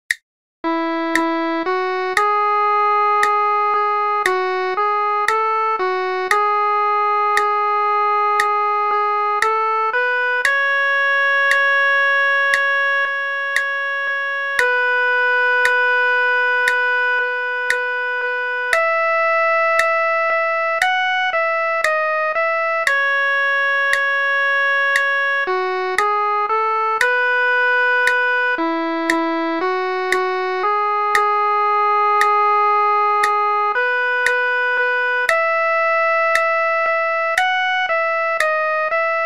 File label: soprano